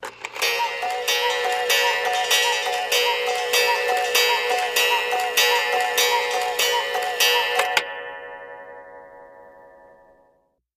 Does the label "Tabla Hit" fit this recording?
No